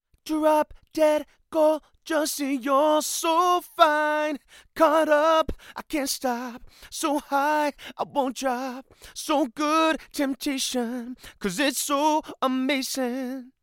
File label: lewitt male vocals lct840; студийным микрофоном